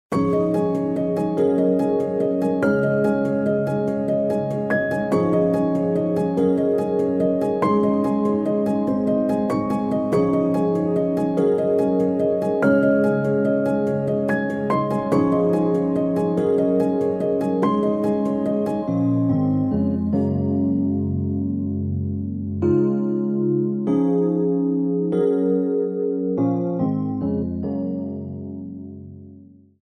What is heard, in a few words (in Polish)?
instrumental, Wersja instrumentalna